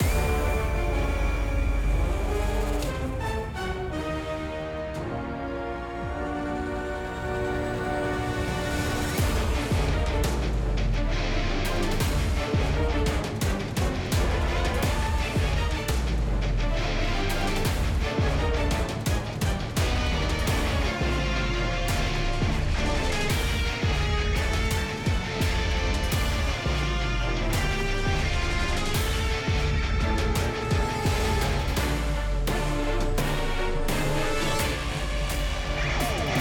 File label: Battle Boss Vulcan